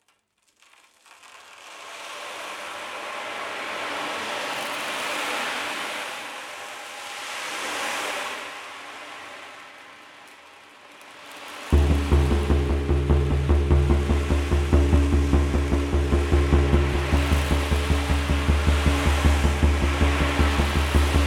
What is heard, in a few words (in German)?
Hohe Trommel Oceandrum (Wasser)